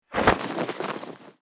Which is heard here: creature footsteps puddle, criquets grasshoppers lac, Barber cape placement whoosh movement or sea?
Barber cape placement whoosh movement